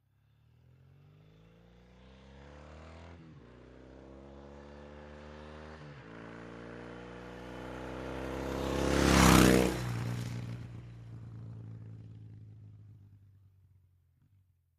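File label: Motorcycle Norton Accelerate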